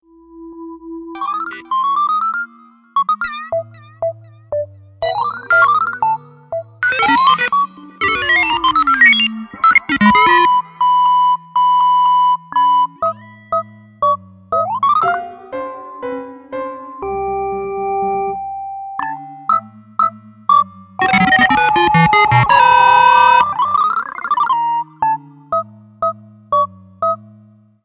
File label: Old School Video Game Ringtone